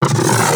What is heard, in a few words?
Arch Gun Alt Charge Up; Warframe; Weapons; Archwing; Hildryn